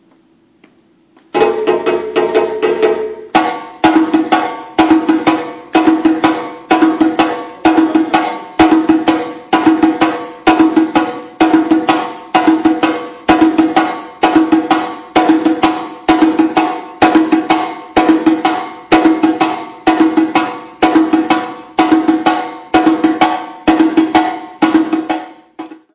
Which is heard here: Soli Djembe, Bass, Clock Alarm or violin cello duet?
Soli Djembe